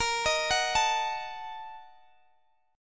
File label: A sharp Major 7th Suspended 4th Sharp 5th, chord